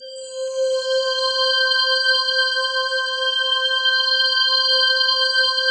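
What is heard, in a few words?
PAD, atmospheric pads; Best Service; XX Large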